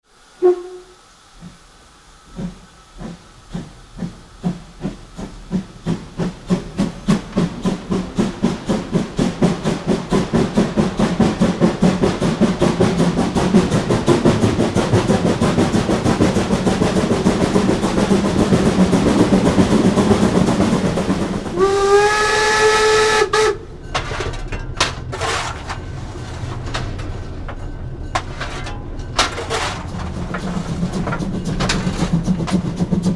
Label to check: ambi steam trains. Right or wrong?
Right